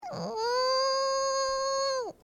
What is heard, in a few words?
brat noises